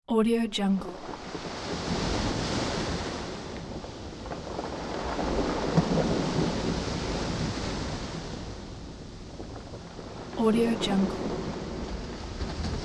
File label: ocean waves on rock